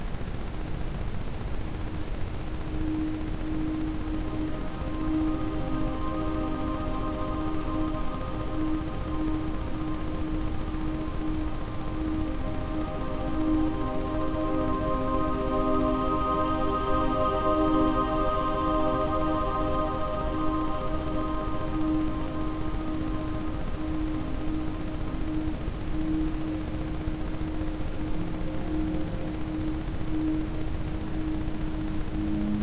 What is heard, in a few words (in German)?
Rife Morgen Mojo mit Ambient Sound; rife frequenzen